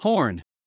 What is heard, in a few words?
HORN